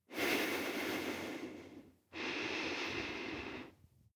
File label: breath female nose